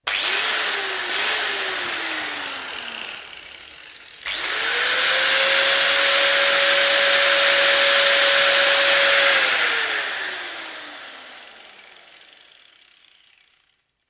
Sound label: Electric grinder, freewheels; Hand Tool; Hand Tools; BBC